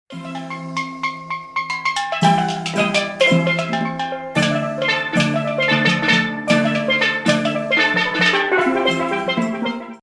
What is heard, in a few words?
idiophone